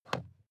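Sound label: sports car interior pedal clutch release soft; verkehr transport; autos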